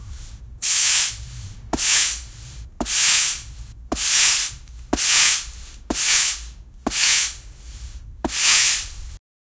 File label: broom sound, cleaning